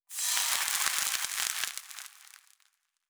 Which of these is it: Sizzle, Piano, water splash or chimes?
Sizzle